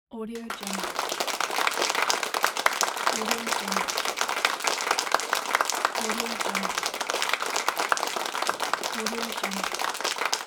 applause medium crowd indoor

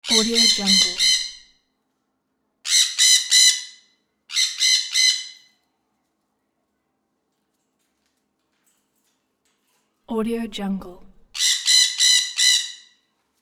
small parrot constant calls; sound ideas

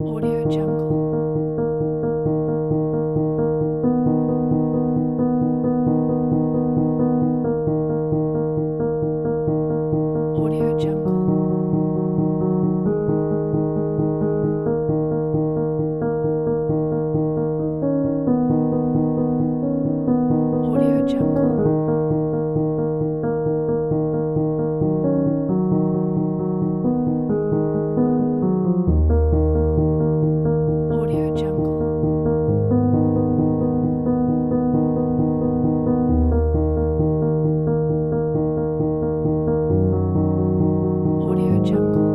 creative inspiration

